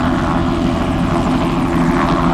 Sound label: COPTER LO, Helicopter; SFX